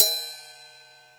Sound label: Ride; osu